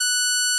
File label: Odd Increase F#6